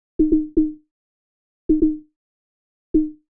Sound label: Tom Hi; VariBreaks; hydrogen